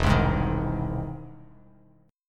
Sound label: E Augmented Major 7th E down Bass Standard; E+M7 strummed; JGuitar